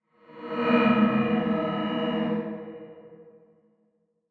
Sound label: Feedback Metallic Sweep Rear To Front